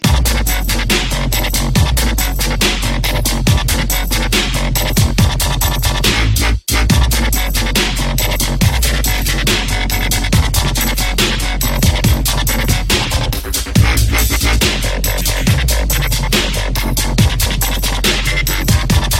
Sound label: Ringtone